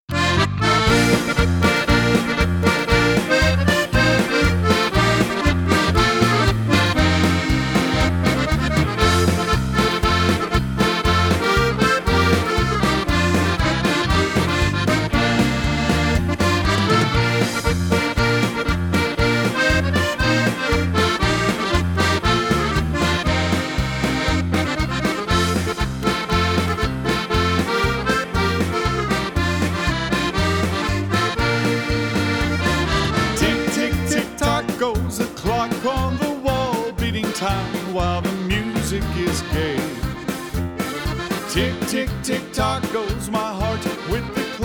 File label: Tic Tock Polka